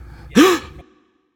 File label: breath; smite; zhongkui